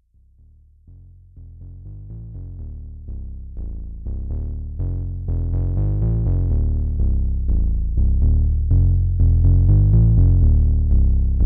MicroBrute Bass

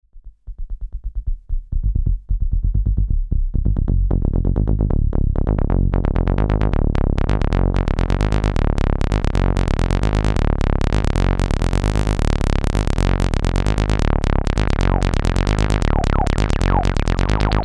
Volca Bass

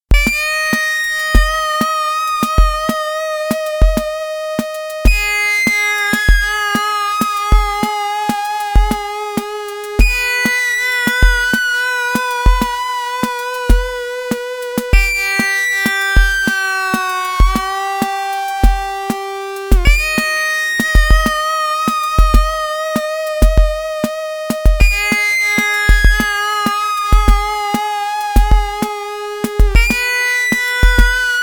Waldorf Rocket Mono Osc Sync

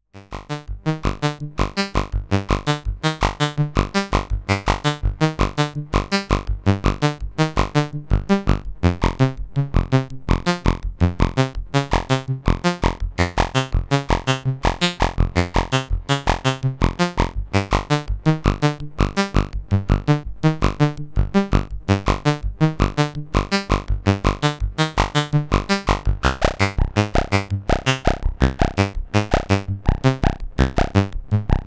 Sequential Prophet5 Slow SEQU

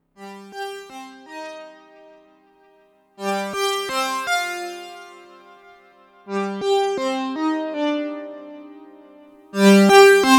jupiter4 short solo

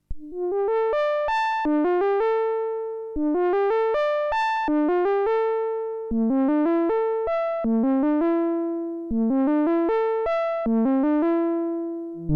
ARP2600 VCO

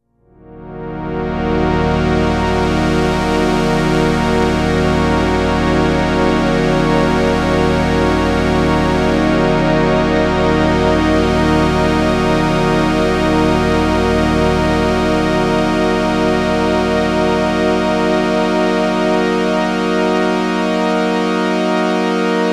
Oberheim OB8 hard strings

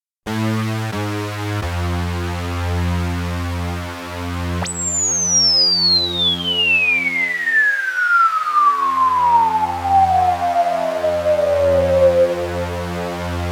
Solaris Unison HPF Ribbon, synthesizer

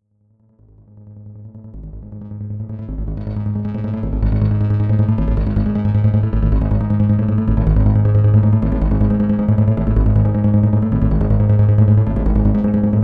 SUPER6 Arpeggio

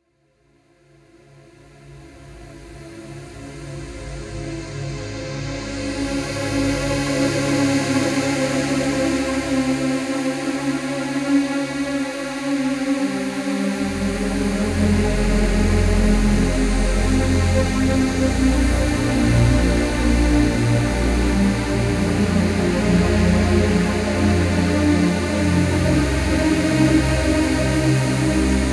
SUPER6 Polyphony

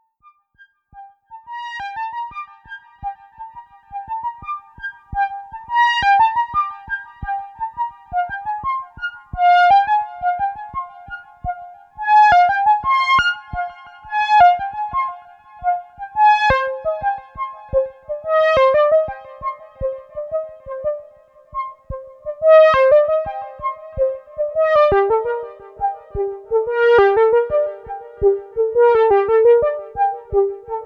Jupiter 4 lead solo

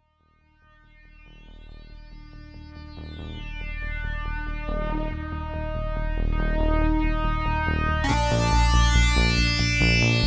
Roland JDXA Sync Sound

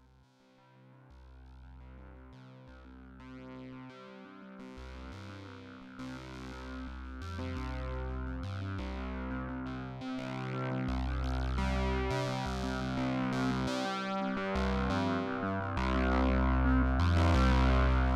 Model 15 Fixed Filter Sequ, synthesizer

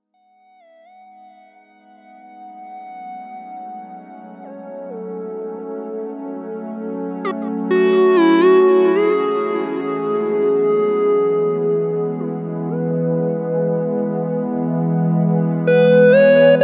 Vermona Mono Lancet Pulse

